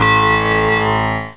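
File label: deep bell, perc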